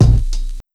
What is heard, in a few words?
Body Kick; Kick Snare Layers; Drum Bits; layering